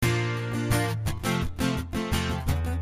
Strumming Bb,F 86

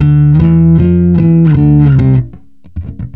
Bass Chords D, E, F, E, D, C 76

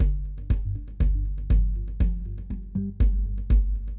Mallimban Gm7 120

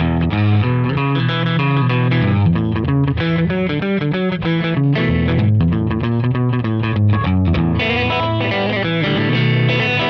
Verse Partial Progression Boogie E; Loops

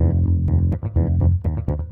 Bb 120 Funk Rock

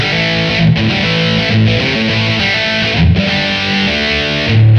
rhythm, 100

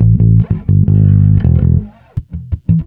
Bass A, G, E 084

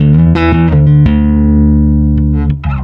Bass Chords Gb, E 085

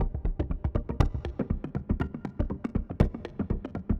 Mallimban C7 120

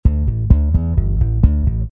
Bass D 131, Electric

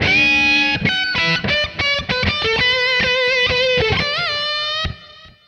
E16 89 solo

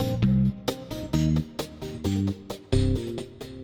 Funky 125 Cm7; Loops

